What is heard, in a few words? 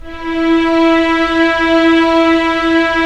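STR String Section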